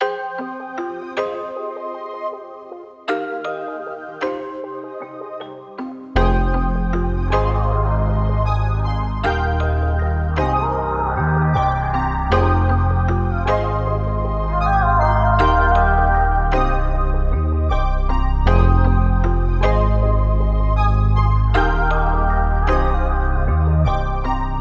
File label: melodic